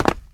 concrete land; Original TK Sounds

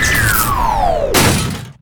missile interceptor hit; Original TK Sounds

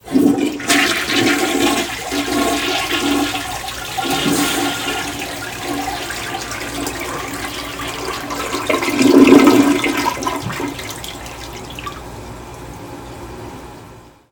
toilet flush; Golden Crayon Sounds

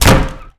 wall wood; Golden Crayon Sounds

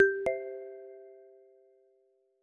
Milestone; iOS 26 Sounds

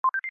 Alert Connected Low Latency Haptic, iOS Sounds; SpartanConnected

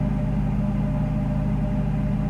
fridge; Golden Crayon Sounds

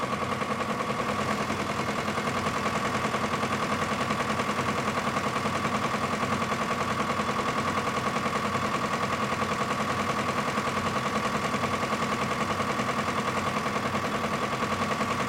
generator; Golden Crayon Sounds

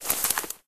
thick forest step; Golden Crayon Sounds

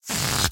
balloon; RS Games Sounds; Monopoly

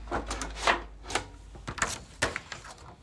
brailler unload paper; Golden Crayon Sounds

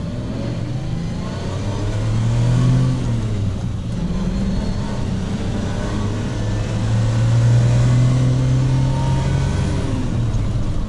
door move; Golden Crayon Sounds

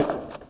bleacher step; Golden Crayon Sounds

